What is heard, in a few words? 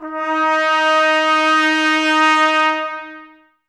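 trumpets D#, brass; 90 s Sample CDs; Miroslav Vitous; Woodwind Brass Ensemble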